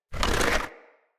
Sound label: Wood Stool Drag Scrape